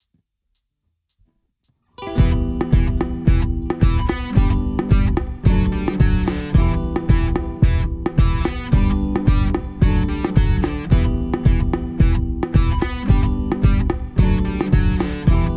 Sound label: Riff Backing